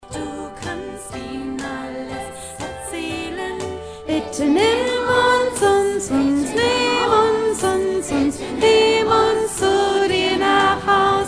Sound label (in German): Mittelstimme am Ende zum Proben